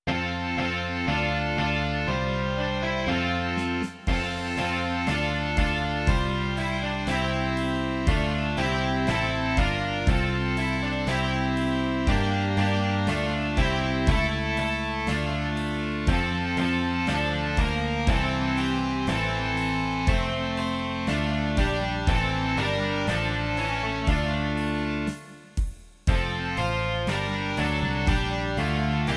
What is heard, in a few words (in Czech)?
rock